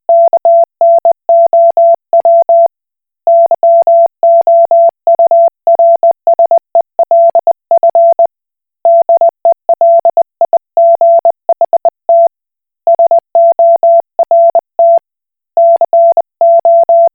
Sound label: Koch Morse